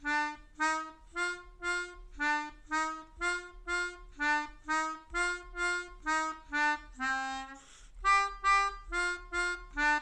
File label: Russian National Anthem, Melodica